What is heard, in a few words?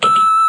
keypress, computer; federation